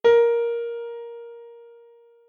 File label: a#3, piano